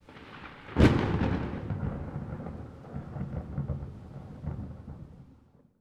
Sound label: LIGHTNING